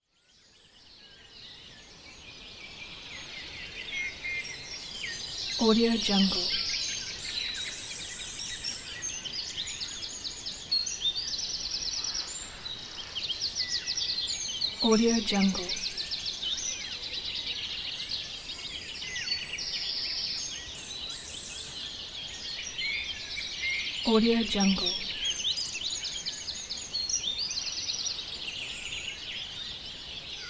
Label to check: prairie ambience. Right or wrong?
Right